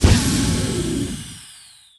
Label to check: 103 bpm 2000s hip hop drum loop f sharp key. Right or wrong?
Wrong